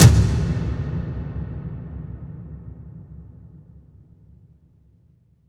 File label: toms, cinematic impact, drum